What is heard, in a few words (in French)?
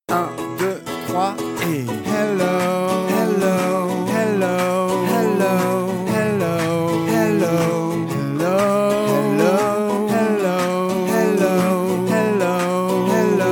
refrain outro 2 voix